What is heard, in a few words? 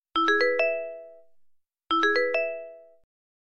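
message sound on blackberry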